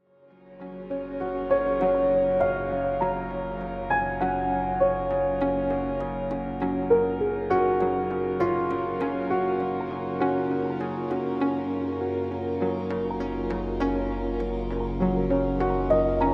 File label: Deep Relaxation